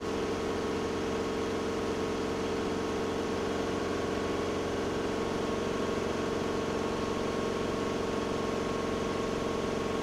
Robinson, R44, Onbrd, Take Off, Fly, Engine, Right; Pole Position Production; Robinson R44 Raven II 2004; DPA4062